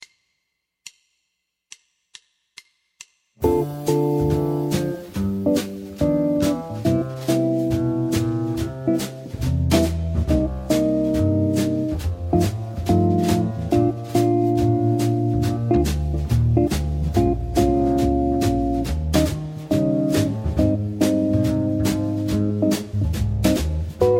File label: jazz guitar chords exercise